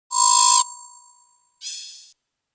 evolution scan

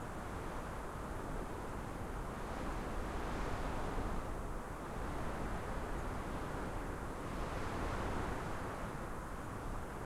ambi main

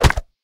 crash enemy; SpaceTrain

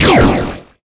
shot laser circle

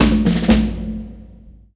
click start

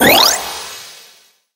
energy upgrade

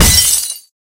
hit weap dir sol glass

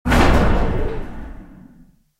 sfx intro lab

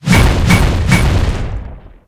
hit thunder

